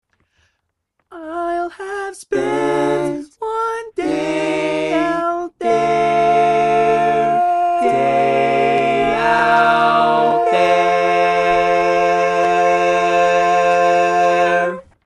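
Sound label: baritone, barbershop tags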